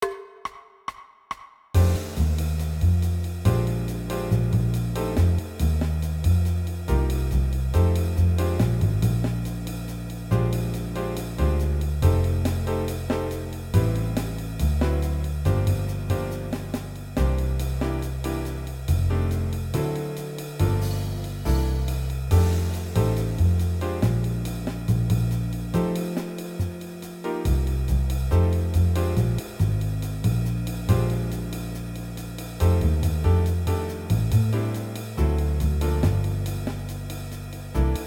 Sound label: Blues Forma